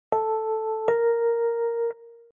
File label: Semitone Up